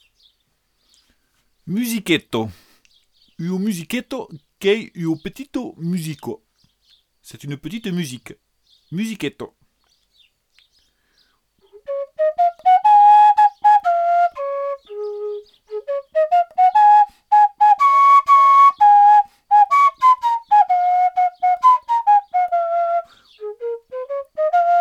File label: musiqueta